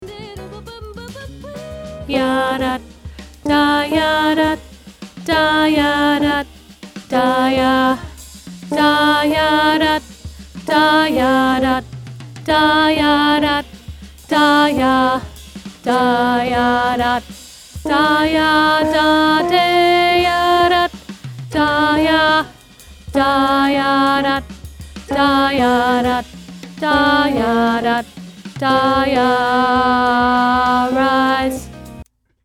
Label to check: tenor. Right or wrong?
Right